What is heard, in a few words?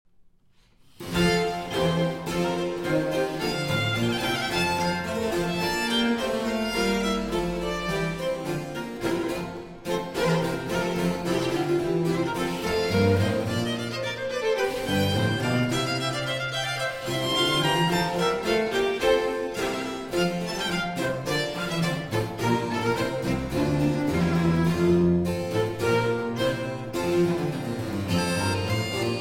Concerto For Musette, 2 Violins and Basso Continuo in G Major; III. Allegro